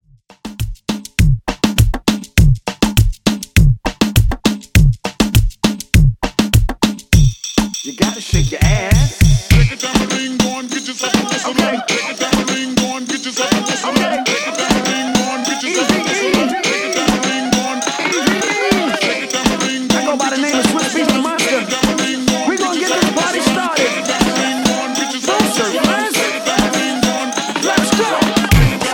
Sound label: Reggaeton Mix 101Bpm; djyan